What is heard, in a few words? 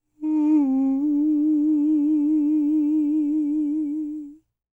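falsetto, Vocal Planet Jazz FX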